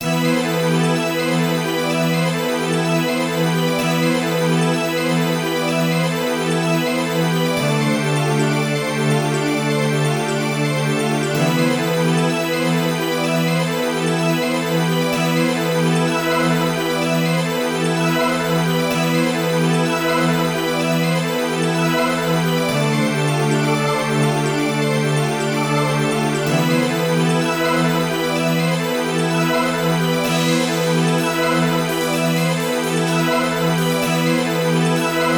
Soul Drain bpm 127

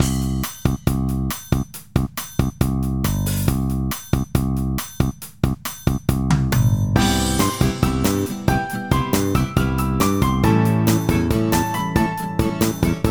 Willfully bpm 138

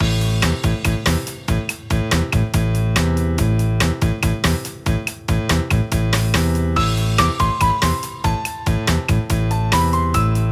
BPM 142

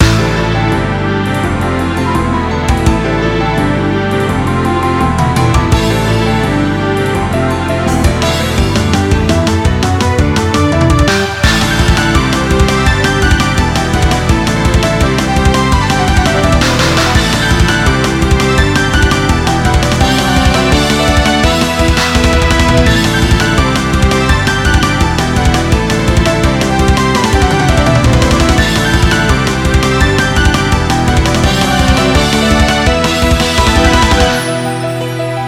Flight From a Threat bpm 168

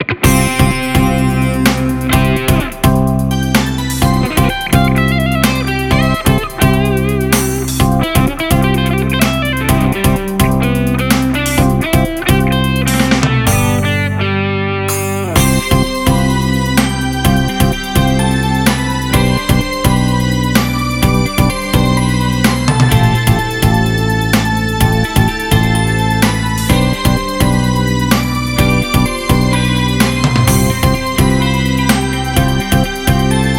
Street BPM 127

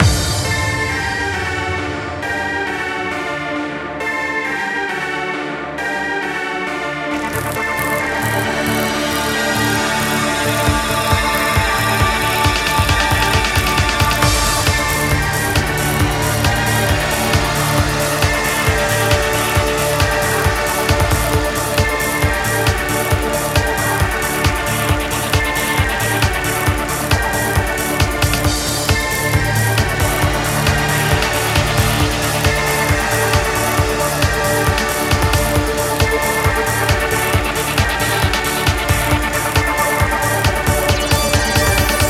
BPM 135

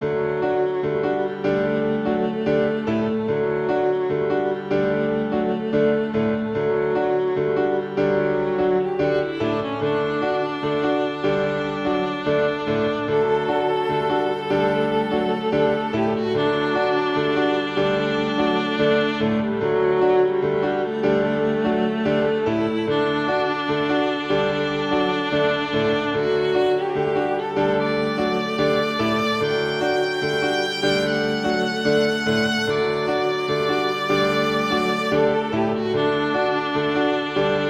Quiet Days bpm 147

